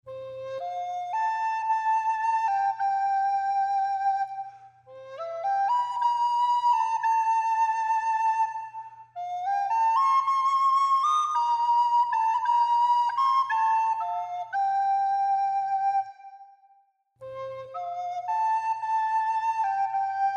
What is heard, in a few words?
recorder